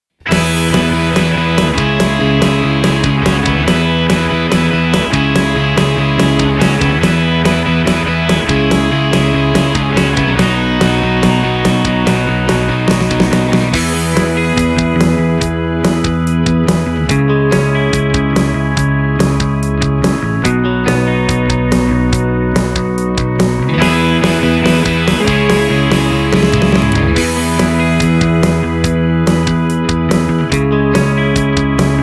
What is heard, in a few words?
Pop Rock